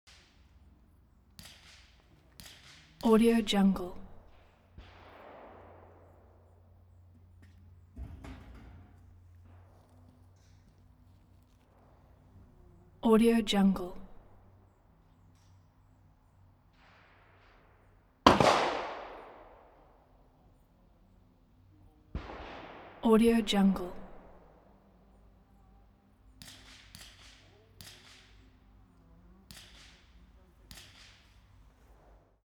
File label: atmo range gun shooting range